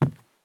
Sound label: jump wood; Escape from Tarkov